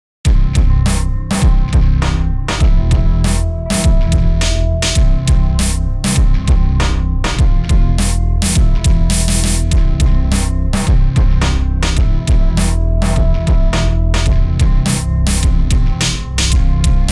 Downtown Funk; Clowning with Monsters

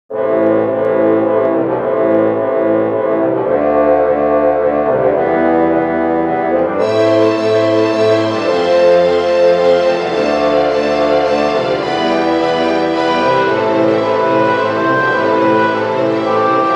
Oboe only No horn

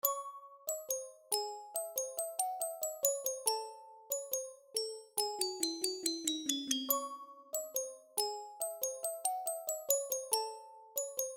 horror

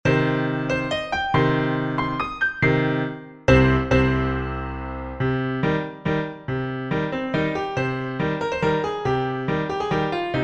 Waltz

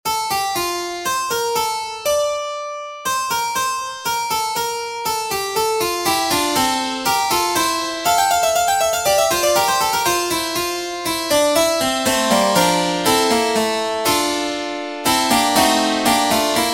fugue

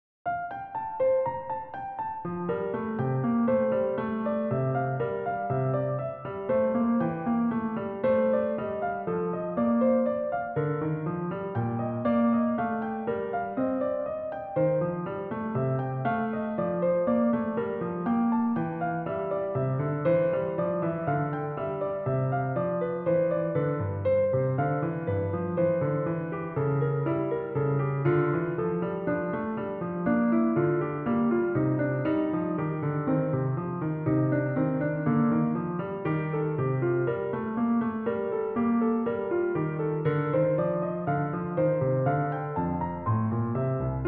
Invention

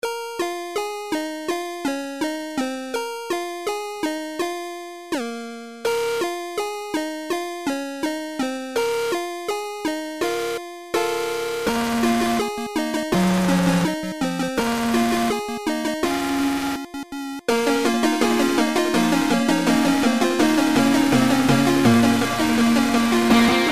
boss fight

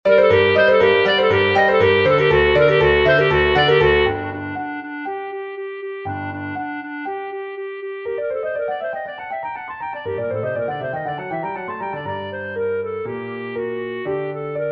Clarinet sonata; movement 2

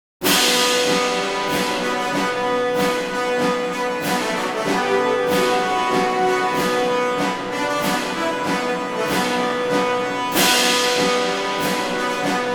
March; Magnolia

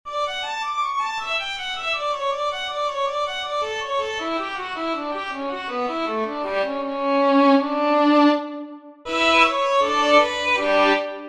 violin solo

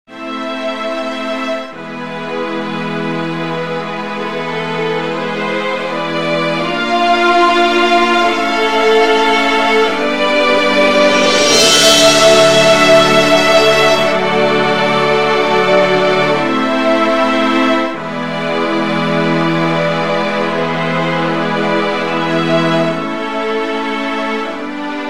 orchestral section only; The Forest Of Dreams

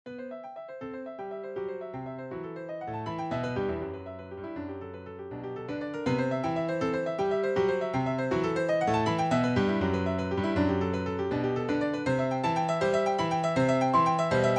Etude, etude for arpeggios

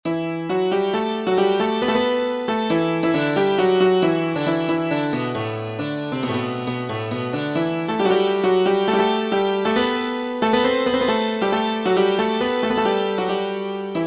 solo

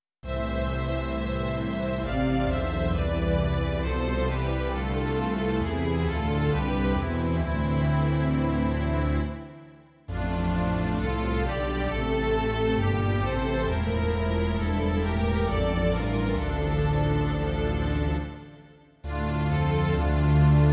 Elegy for String Orchestra

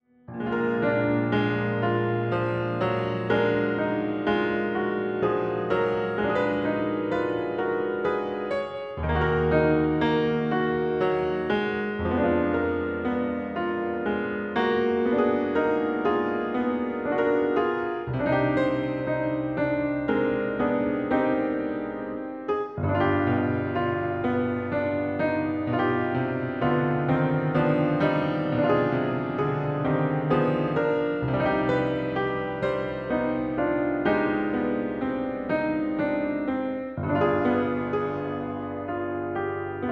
Fugue B minor, symphonic fugue orchestration; Episode 4

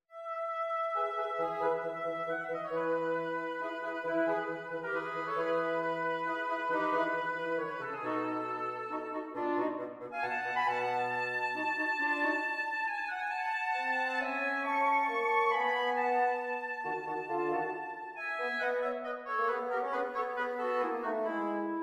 piano chamber

